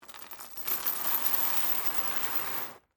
Chain Drag Wood, Industry, Chain, Drag, Across, Wood, Surface; Factories; Blast Wave